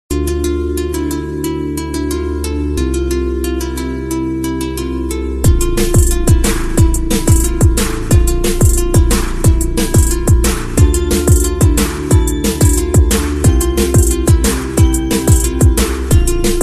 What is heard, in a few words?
guitar drum ringtone